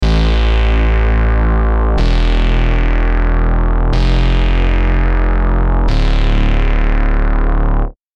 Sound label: Bass; Terminal; Unison Billboard "Hot 100" Collection for Omnisphere